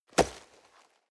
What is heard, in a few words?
nylon leather hit